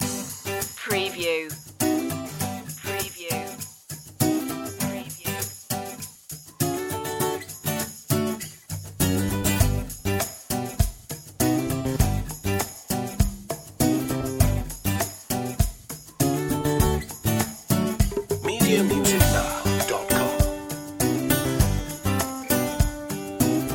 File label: power of now loop